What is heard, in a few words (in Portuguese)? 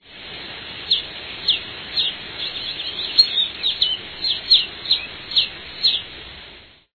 caboclinho-de-faixa